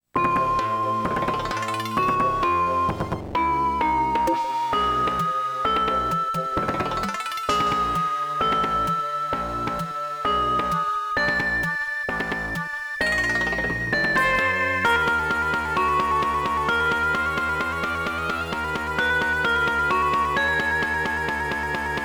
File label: Vibraphone; 16bit; genesis; gamerip; Cadash; The 16bit Audiophile Project; Belville Vibraphone (Bricks and Water Scene)